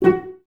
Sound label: CES F#4 Pizz, Cellos, String Ensembles; Miroslav Vitous